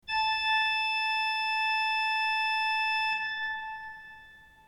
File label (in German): ton, orgel